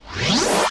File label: ZIP JET; Microsoft Office 95 Professional; VALUPACK